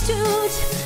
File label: toot